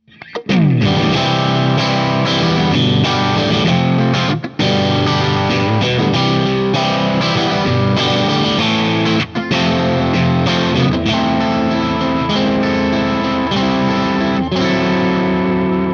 st crunch

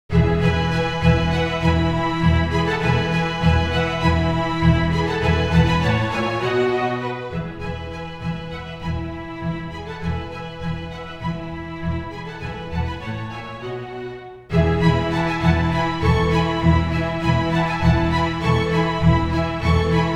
cinematic

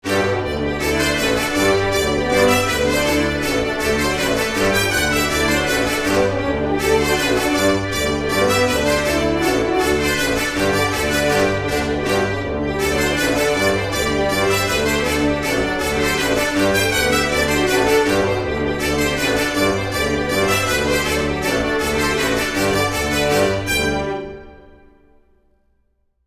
Cinebrass

